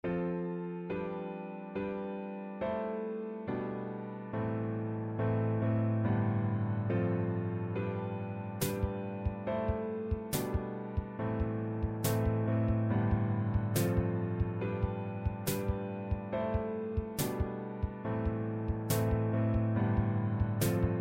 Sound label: piano song